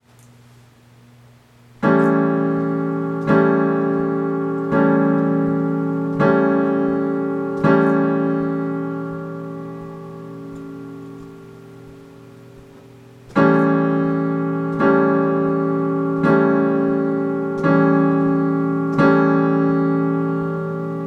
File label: chord old folks at home